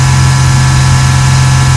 Juggernaut Driving; Burnin' Rubber 5 HD

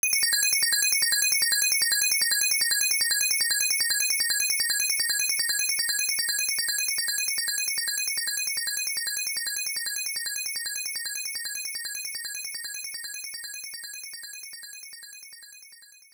All Clear; Bubble Bobble; X68000

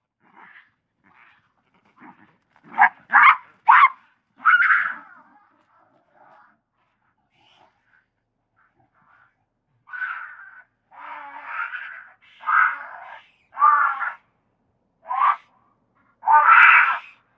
Monst Grunt; Legend of Keepers (gamerip) (2019)

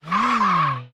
spider boss move short

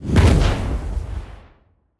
Fire; Shaman Fire Imp1

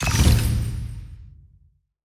Quest Complete; starbites

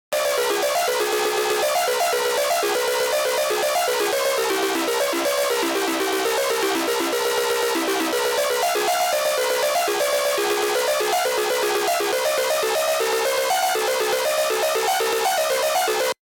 Synth; Level 2.1.2 Synth

